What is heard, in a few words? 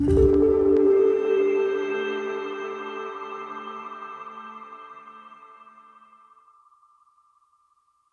Jingles